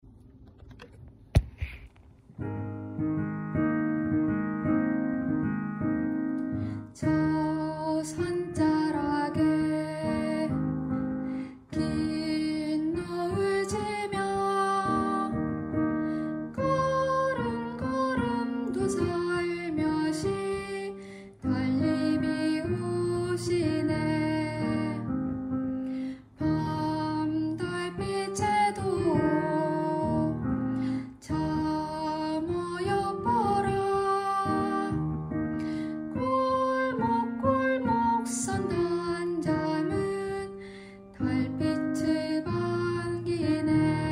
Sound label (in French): belle patrie alto